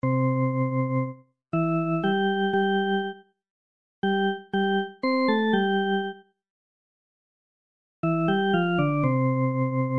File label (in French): musique avec silences